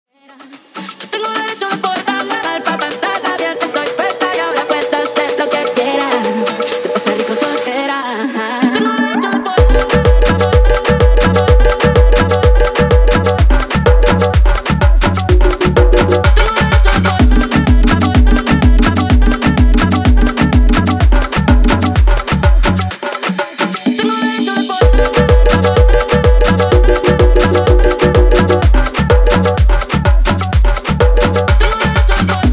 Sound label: afro remix 126 bpm